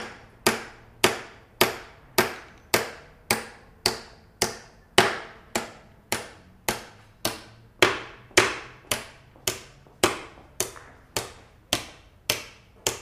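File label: Hammering Nails Interior; hammers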